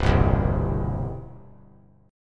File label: C Major; strummed; JGuitar